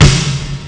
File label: snare, akai; Amiga Soundtracker Sample Packs